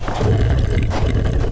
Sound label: push box; gearbox; debris